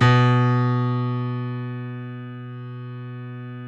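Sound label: B1 piano right; Northstar - New Gold VOL-2; BOS.GRAND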